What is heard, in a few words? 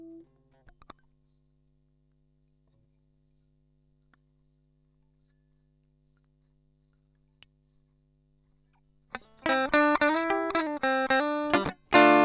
Amp